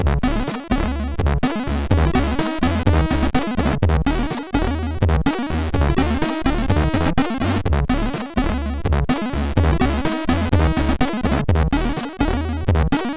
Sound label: sid, MOS6581; hvsc